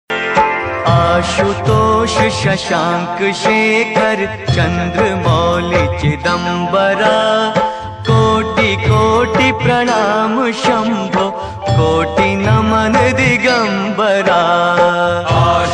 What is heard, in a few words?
Shiv Stuti Ringtone